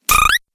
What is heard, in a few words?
Magic Coin, cartoon; Cartoon Sound Design Sound Effects